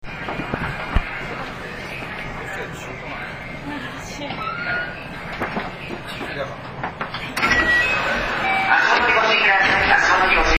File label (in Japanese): ドア開け, 223系3000番台; ドア開閉時に「カラカラ・・・・」となるのが特徴。; ドア開閉音